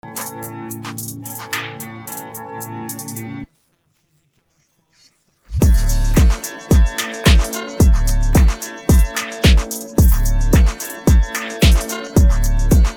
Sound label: instrumental; persian